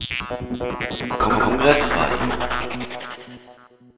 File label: jingle; congress radio